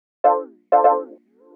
house synth 125 A, keys

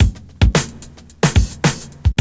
FUNK 110, loop; 100-114 BPM

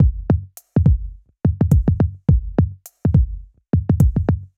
105 Loose Grooves, Percussion; Touch Loops; ElementsOne; AbstractRhythms

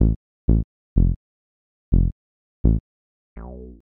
HOUSE BASS 125 A

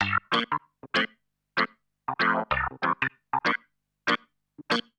ORGAN 96 G; OLDSCHOOL; Keys

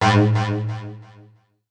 FX SHOT TEKNO 140; Techno2; Singleshots

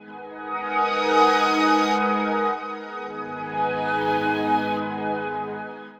SYNTH PAD ETHNO 160 C, Sphere

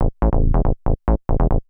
TECHNO BASS 140 A

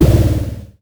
NOIZ FX; ELECTRIC-SET

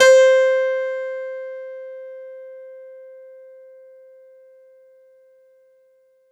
Clavi, Digital Keys

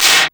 DEAD CLAPS; ELECTRIC-SET

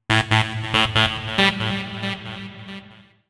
TECHNO SYNTH 140; Keys

